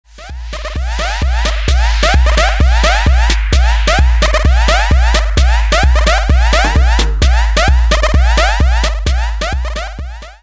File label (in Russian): Звук на смс